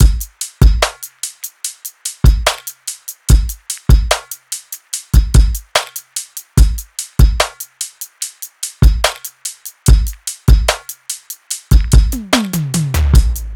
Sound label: drums Opala 73, Full Drum Loops; drum sample pack; SEISMIC; Slate